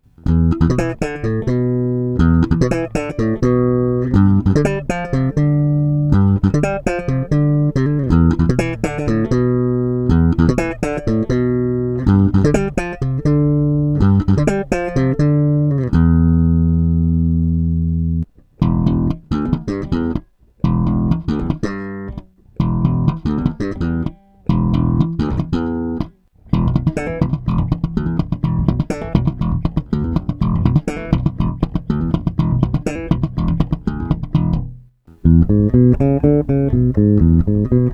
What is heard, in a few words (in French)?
custom micros doubles eq plat, basse